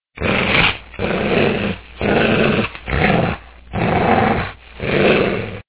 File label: Wolf Growling